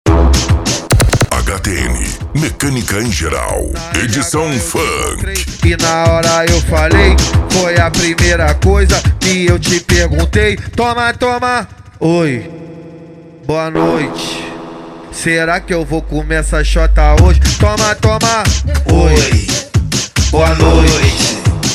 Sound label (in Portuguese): mecanica em geral edicao funk